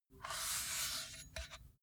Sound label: metal pole slide